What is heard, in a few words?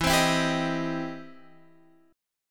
E Minor Major 7th Sharp 5th, E, down, Guitar, Open F; EmM7#5 strummed